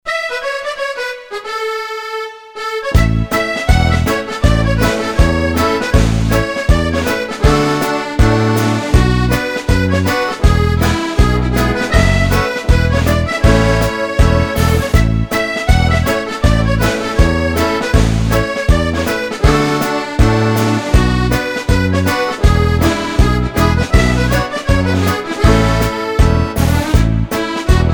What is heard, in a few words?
2-4 MARCH, accordion